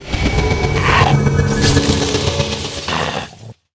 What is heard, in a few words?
Leviathan Poison Cas